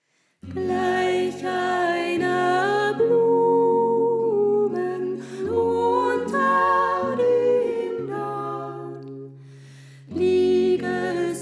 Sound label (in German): Sopran; Gleich Einer Blumen